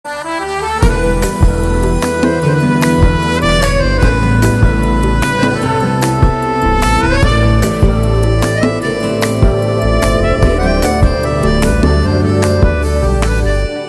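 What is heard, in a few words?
background music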